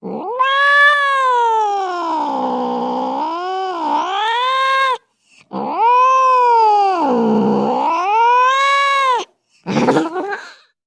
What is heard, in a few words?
Cat Domestic Growl